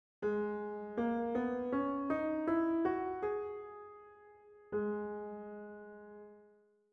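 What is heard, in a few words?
G sharp minor scale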